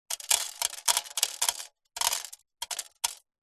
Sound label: foley several small stones drop one by one on metal